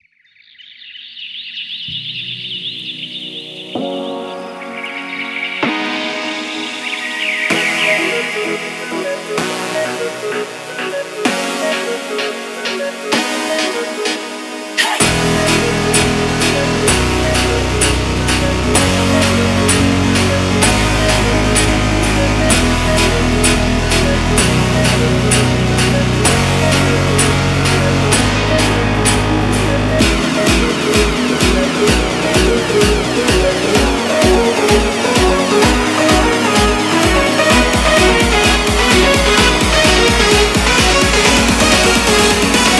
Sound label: crushed guitar